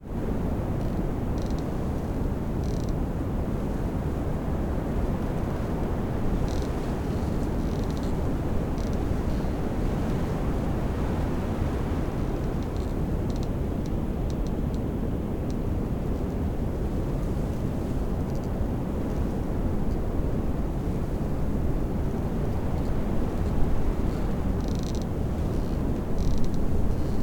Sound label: Wind squeaking tree leaves branches bird, Bora wind; European local wind